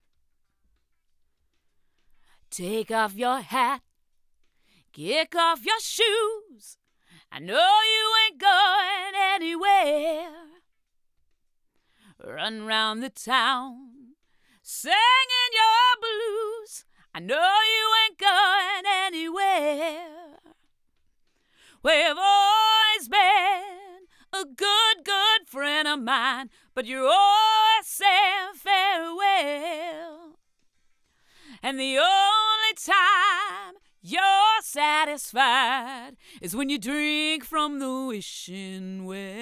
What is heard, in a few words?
Vox Fet II Fairchild; Daking